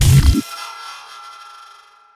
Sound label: portal invalid surface, portalgun; garrysmod